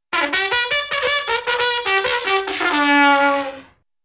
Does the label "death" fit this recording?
No